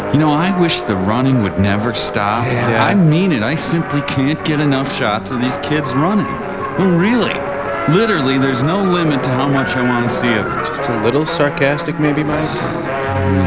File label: kids running